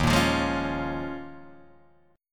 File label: Eb 9th Suspended 4th, Guitar, Drop D